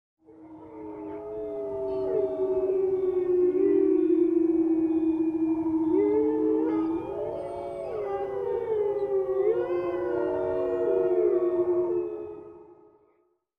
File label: Wolves Waiting